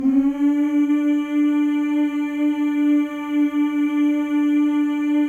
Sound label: male; Spectrasonics; Symphony of Voices